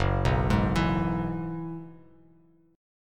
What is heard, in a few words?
F sharp 7th Suspended 2nd Suspended 4th F sharp down Bass Standard; F#7sus2sus4 arpeggiated